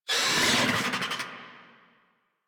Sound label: Infested Charger Attack; Warframe